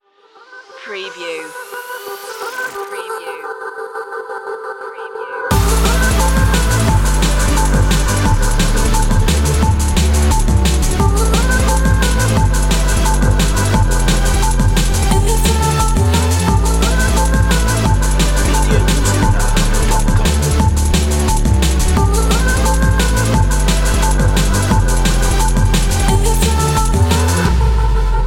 digital siren

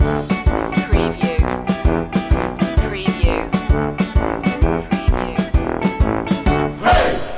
polka loop

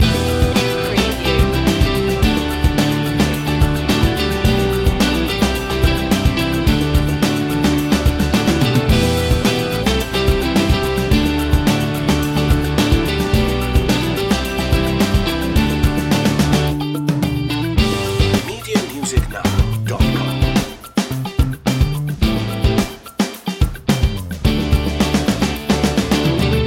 piano groovin loop

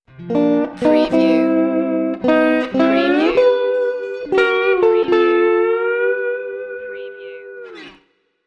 silly slides stinger

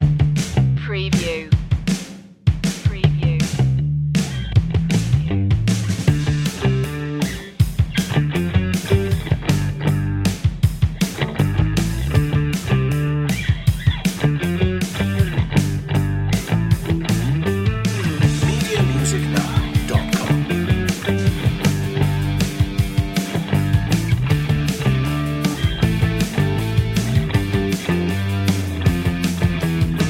turbo energy rock

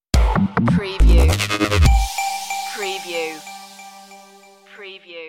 step 2 edge stinger

